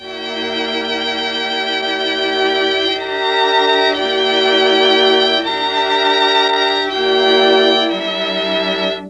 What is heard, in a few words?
F#3, Mellotron; Mike Pinder - Mellotron Archive CD